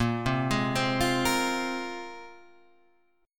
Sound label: A sharp 7th Suspended 2nd, guitar chord